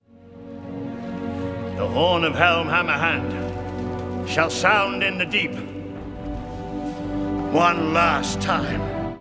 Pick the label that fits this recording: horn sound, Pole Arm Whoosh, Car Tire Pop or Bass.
horn sound